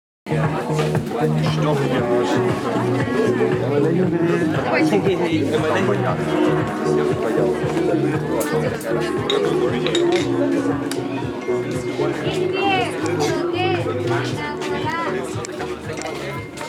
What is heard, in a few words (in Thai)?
เสียงบรรยากาศ 360 bar; ThreeSixty Bar; เกาะพะงัน; ร้านอาหาร และบาร์ ที่ตั้งอยู่บริเวณบนเนินเขา